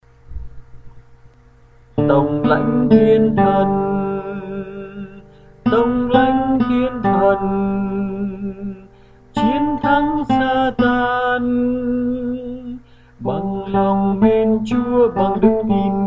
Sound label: sop Alto